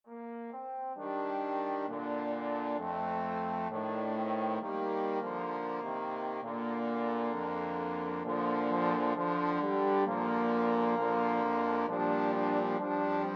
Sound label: this little light, trombone quartet